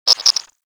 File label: Garden Animal; Warframe; Clan Dojo; Dojo Garden Animals